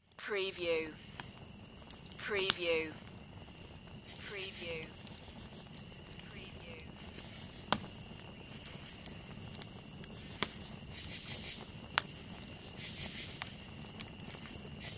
Campsite Fire

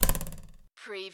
CARTOON RULER TWANG

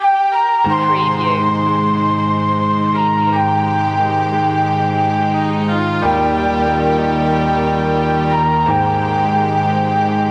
melancholic flute

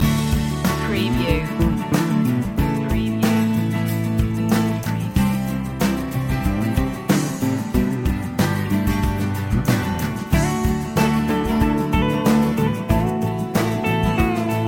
positive days loop

